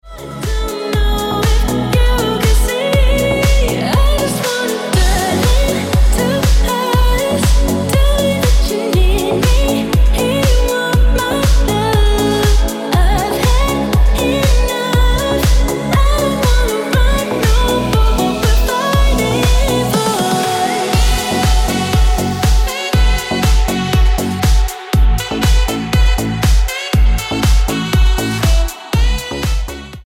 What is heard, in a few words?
Ringtone